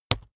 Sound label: keyboard laptop enter press fast hard; kommunikation; computer